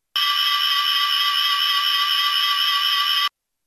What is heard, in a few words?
PCM Spect, Roland D-50